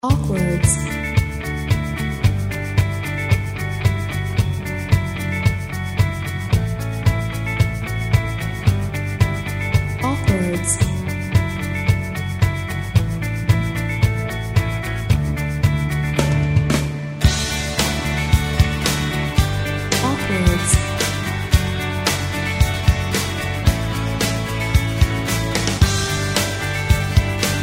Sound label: uplifting energetic and inspiring guitar